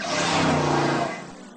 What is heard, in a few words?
laser point; BALLY